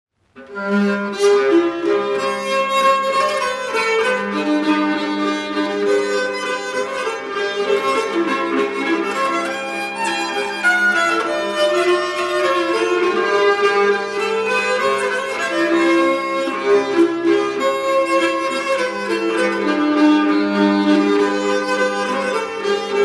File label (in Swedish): Nyckelharpan